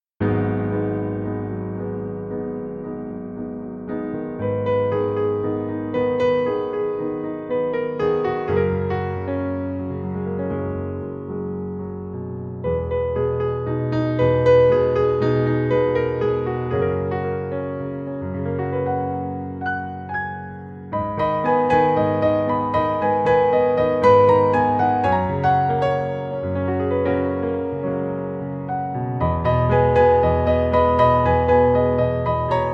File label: Piano Version